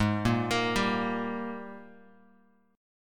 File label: Ab Major 7th Suspended 2nd Ab down Bass Standard; arpeggiated